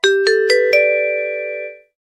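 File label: iPhone XR Sms Ringtone; iPhone XIR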